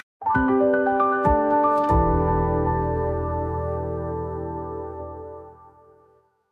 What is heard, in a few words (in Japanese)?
patto kanketsu sound short; free jingles